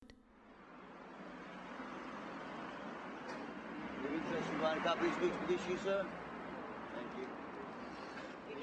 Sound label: Street Sounds; London Undersound